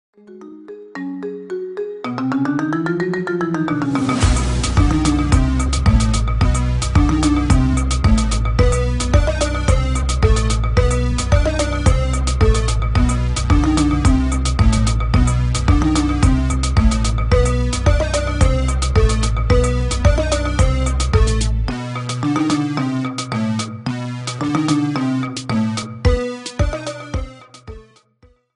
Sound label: marimba ringtone remix